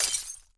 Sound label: glass break